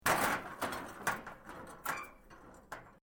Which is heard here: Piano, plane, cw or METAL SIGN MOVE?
METAL SIGN MOVE